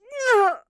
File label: Hunter Grunt Hit; Twilight Huntress; Realm Royale